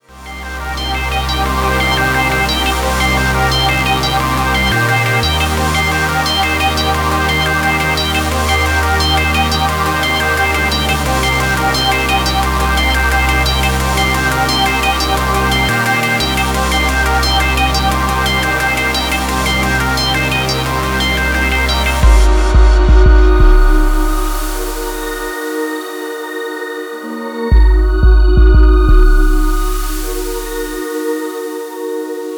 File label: Bass Voltage Remix